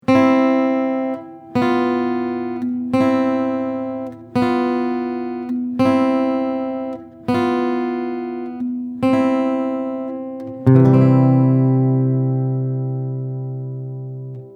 Septakkord

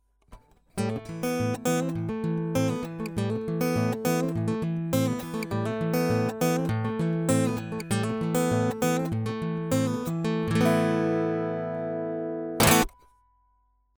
Akustik Git Big Amp Piezo

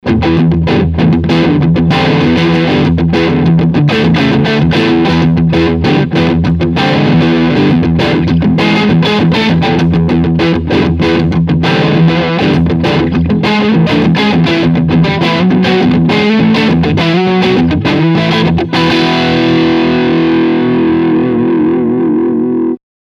Overdrive Lick beide HB

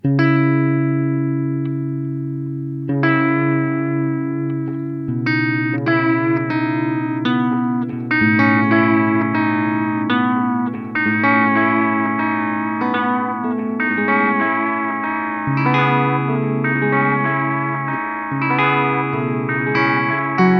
EHX Pico Rerun langes Delay Modulation u Saturation

